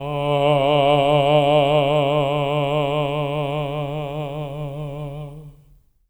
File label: TENOR D3, Voices; Spectrasonics; Symphony of Voices